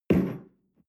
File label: espresso place tablecloth slide short, restaurant cafe